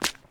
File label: wet concrete step; Golden Crayon Sounds